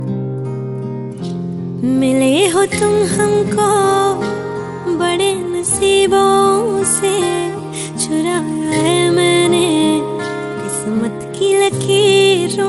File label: Super Hit Romantic Hindi Song Ringtone; ringtones